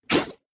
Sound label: spitter; factorio; Factorio 0.14.21; creatures